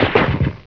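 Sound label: ricochet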